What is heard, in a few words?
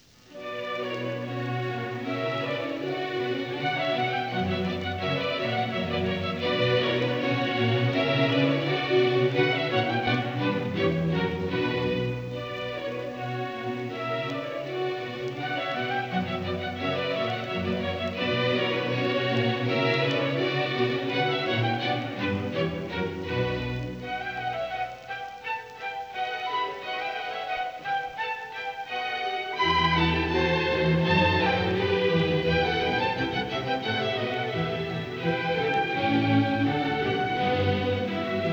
Orchestral Suite No2 BWV1067; Bach; Mengelberg